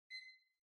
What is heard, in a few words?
Kitchen Timer (Far-Beep)